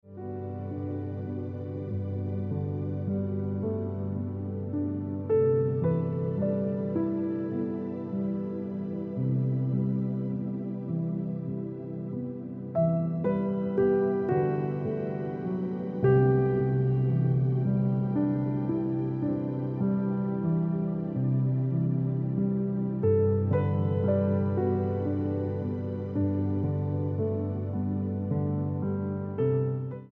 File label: Ringtone